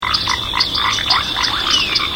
ambient; survival

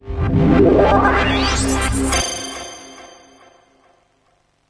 Ping; maomao; Asobi

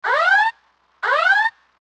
alarm; adventurecat; peacefree

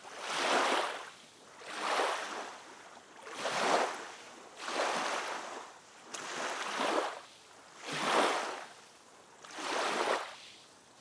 waves lapping; pond5

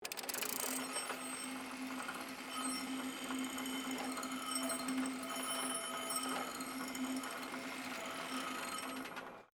sfx ladder

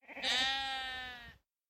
sheep; Flashpoint Ultimate 13

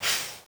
train; madcars2; radicalplay

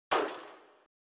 poof; adventurecat; peacefree

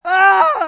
fall gap; survival

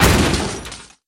chest explode; pond5

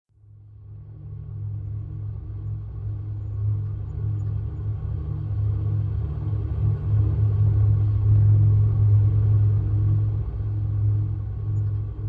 ambient hum

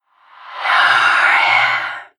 ghost; pond5

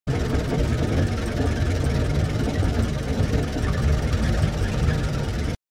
wagon move; coolmathgames

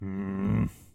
disappoint; halloween

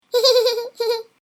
happy; Flashpoint; tobiidynavox; catchme

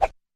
swish arm or weapon

